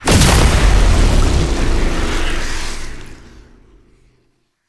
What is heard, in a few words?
Kill Confirmed; Enemies; Warframe; Entrati Doppelganger